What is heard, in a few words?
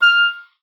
F6, OBOE; Xsample VOL-6